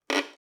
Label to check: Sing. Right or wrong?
Wrong